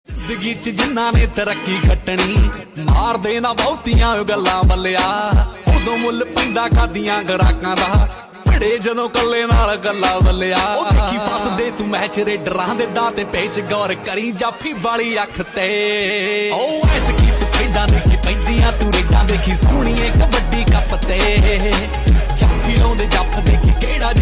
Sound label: Punjabi Tune Ringtone